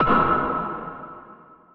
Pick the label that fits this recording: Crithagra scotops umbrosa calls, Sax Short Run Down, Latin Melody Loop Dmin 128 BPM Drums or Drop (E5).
Drop (E5)